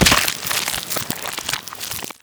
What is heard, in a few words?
swarm attach; weaver